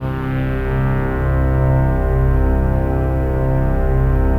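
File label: chord pad, Pads; Best Service; XX Large - Pads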